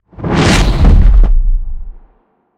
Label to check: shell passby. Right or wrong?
Right